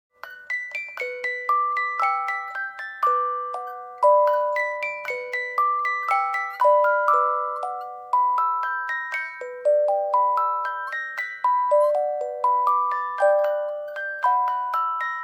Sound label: boites musique